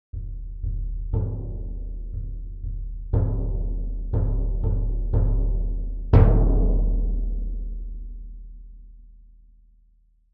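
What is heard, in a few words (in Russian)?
Bolshoj baraban, bass drum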